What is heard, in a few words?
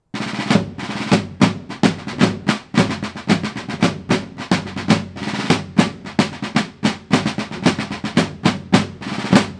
Drum